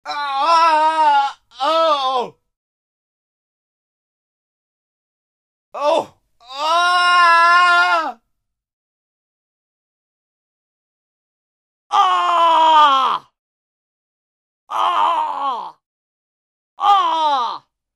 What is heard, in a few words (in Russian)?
predsmertnie vopli i stoni muzhchina